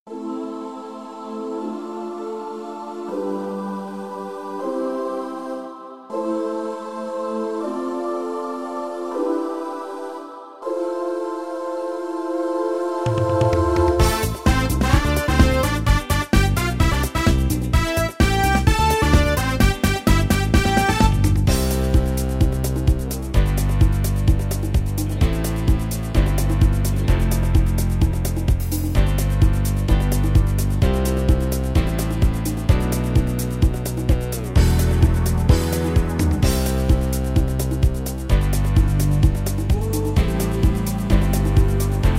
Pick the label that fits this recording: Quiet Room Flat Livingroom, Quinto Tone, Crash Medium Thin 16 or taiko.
taiko